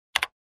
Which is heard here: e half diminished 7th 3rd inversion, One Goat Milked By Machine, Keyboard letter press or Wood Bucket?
Keyboard letter press